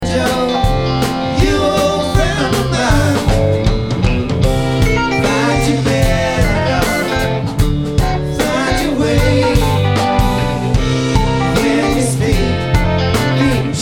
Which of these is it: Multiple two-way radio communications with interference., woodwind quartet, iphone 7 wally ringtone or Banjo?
Banjo